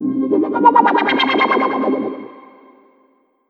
ORCHFX, orchestra, Asian Traditions

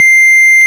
ORG, Farfisa Clarinet

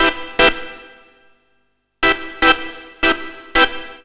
CLAV ORGAN; USB Soundscan vol.32; Roots Disco; AKAI

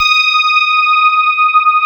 SPARKLES; Wizoo - Powered DX

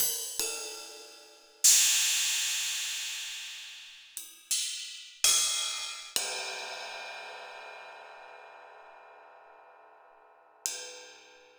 Akai XR-20 Ride

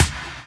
Percussions

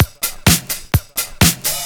Straight Loop 128 BPM, House

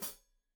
Hat Foot, Hi-Hat, Cymbals Stereo

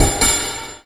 Bell; Sonic Foundry (Sony Creative Software); Esoterik Beatz; Saver FX

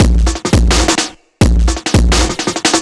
Beat Mooger 170, distorted drum loops 170bpm; musicradar

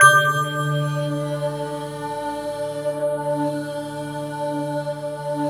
SYN Breath; Best Service Gigapack 1; SYN DIG4

